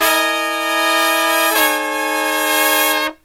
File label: Brass Riffs